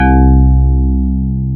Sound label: PIA DX E-PIANOS